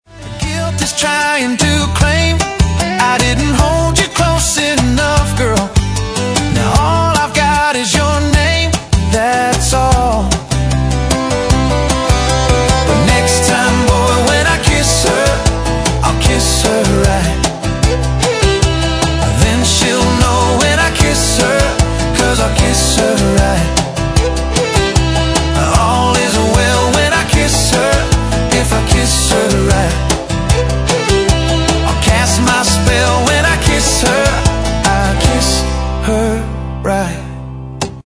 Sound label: Bluegrass Band